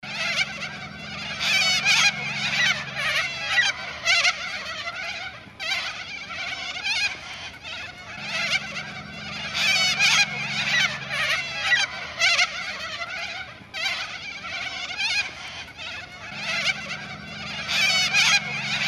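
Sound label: Bare Eyed Cockatoo or Little Corella, parrot calls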